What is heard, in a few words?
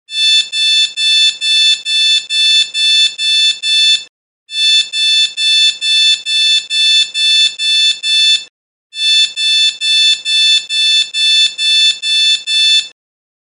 Snooze Alarm Galaxy